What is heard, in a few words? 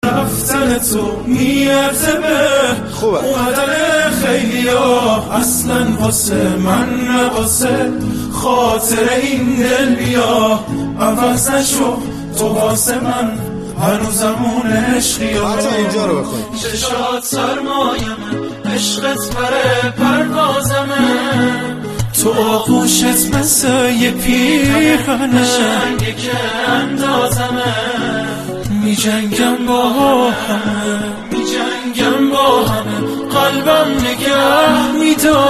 music pap ba gitar; music pop ba gitar